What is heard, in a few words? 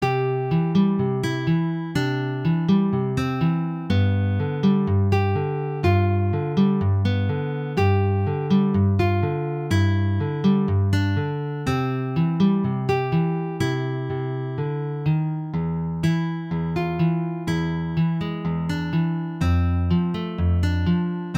picking